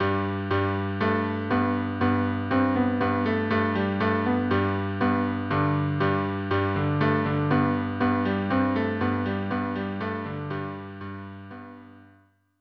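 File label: pastorella viola cello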